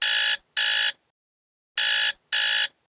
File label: Alarm Buzzer; Vivo; E1T; alarms